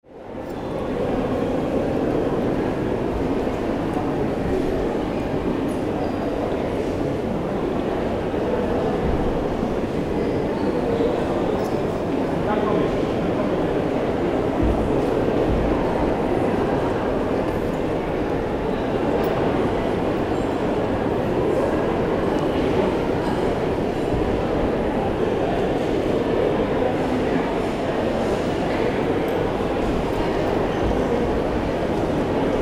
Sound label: big hall very crowded